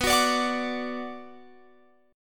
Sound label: B Minor B Guitar Open F; strummed